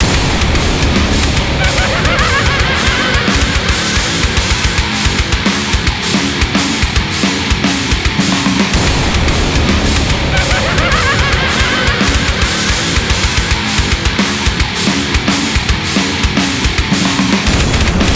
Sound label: Game Over; Zombie Revelations - Shangri La